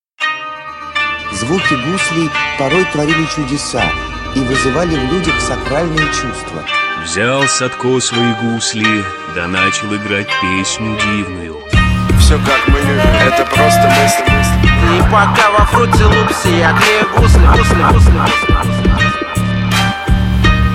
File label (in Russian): GUSLI Zvuki guslej